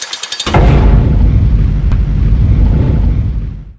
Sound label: valve; xen